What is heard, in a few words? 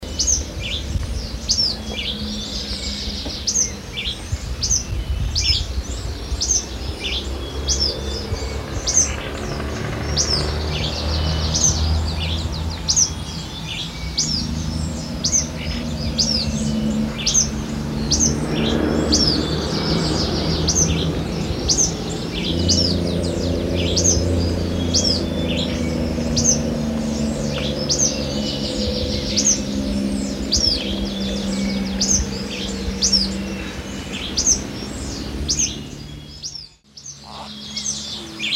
Anairetes nigrocristatus - Black-crested Tit-Tyrant